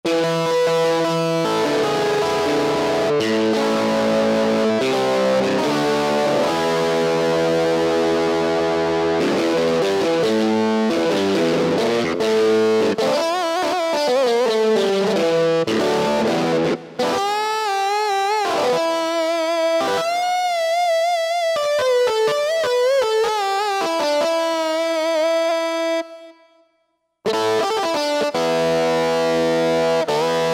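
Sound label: strat, guitar; Factory Patches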